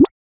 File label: short mellow, design